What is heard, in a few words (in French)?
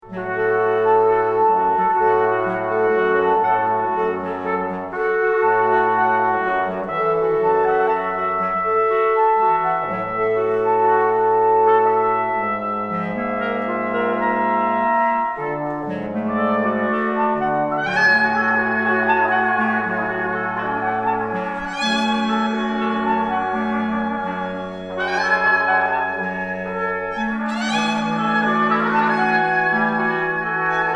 Intermède instrumental; Kopernikus